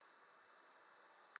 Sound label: silence